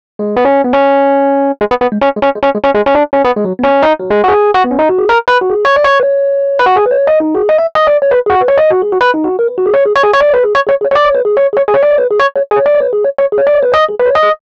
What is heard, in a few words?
Rhodes Solo, Cinematic Funk